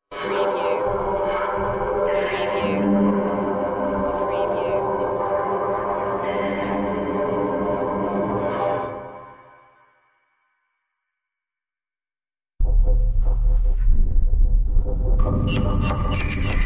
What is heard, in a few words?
intergalactic interference